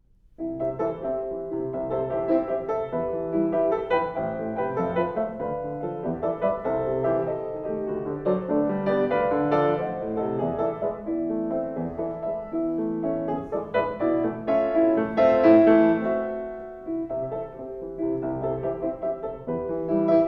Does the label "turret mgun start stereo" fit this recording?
No